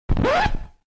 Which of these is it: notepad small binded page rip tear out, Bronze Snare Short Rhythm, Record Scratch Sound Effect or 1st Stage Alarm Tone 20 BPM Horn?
Record Scratch Sound Effect